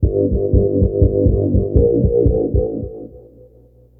Record Stopper (Monster Bass 03); Sonic Foundry (Sony Creative Software) - Stylus Pressure Urban Grooves on Digital Wax

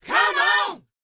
LET, Vocal, R&B Dance, DANCE 120

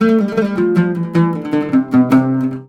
LUTH G, Arabian Traditions, LUTH RIFF; USB Soundscan vol.43 - ArabianTraditions; AKAI

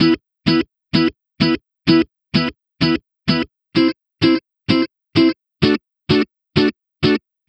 Electric Pop Guitar 128BPM Emin; Vengeance - Essential Clubsounds Vol.4

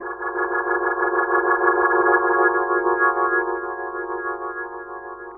ORGN 123, Keys; Houseworx

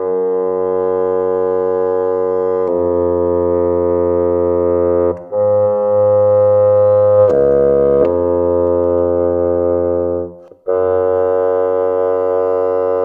Bassoon, Cinematic; Sonic Foundry (Sony Creative Software); Orchestral Series VOL-3 - Cinematic

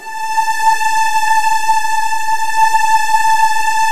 A3, Virtuoso Strings; ILIO; Kirk Hunter Virtuoso Strings VOL-1

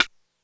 Rimshot, Korg DSS-1 Drums

